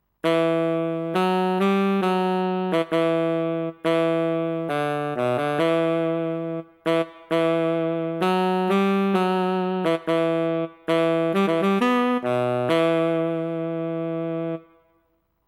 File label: sax main melody